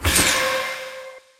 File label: single block win; medallion; Fantasma